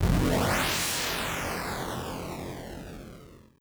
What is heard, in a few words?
jet pass lowbit